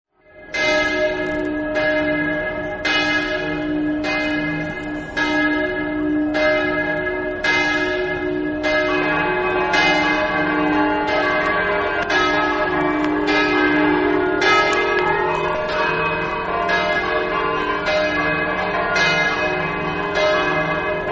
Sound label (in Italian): castelmonte, campane